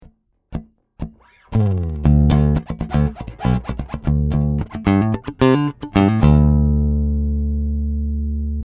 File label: Markbass MB58R CMD 102 Pure Pick Clean DI